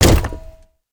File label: Gear shift, vehicles; aronwk collection; ndaudio